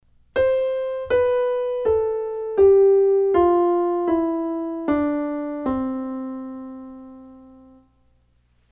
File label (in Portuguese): escala descendente